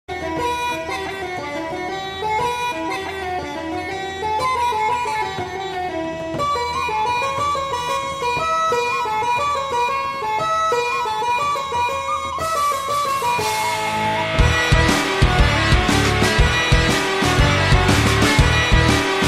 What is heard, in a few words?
Celtic Music